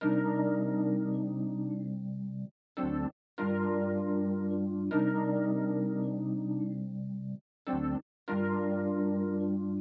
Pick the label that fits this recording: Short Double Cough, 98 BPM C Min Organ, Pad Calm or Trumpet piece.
98 BPM C Min Organ